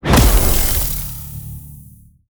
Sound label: complex tech hits